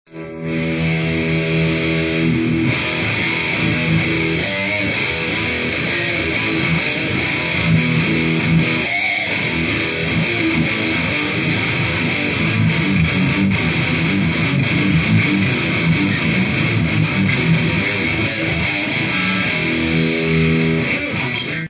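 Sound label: Drive to Scoop Vibe, Laney TFX-2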